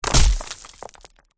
impacts object heavy thud dirt stones debris flying